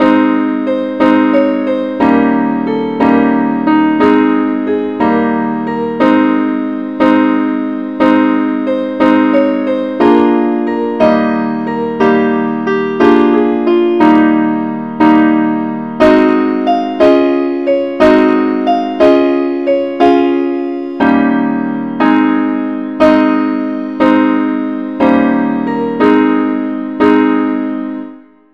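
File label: simple accompaniment key a flat; Angel voices, ever singing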